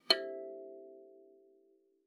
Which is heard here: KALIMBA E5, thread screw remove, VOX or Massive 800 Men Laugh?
KALIMBA E5